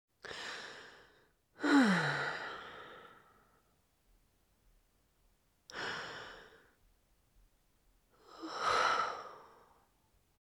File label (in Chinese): 叹息(女人)